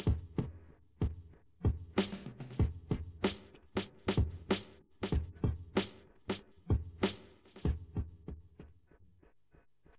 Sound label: wdrums inst src; SiSEC08; SiSEC underdetermined